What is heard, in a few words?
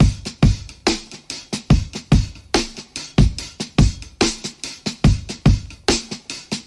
bouncy bouncy, boombap drum loops